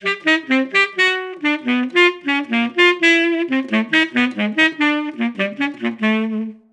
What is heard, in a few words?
Tuba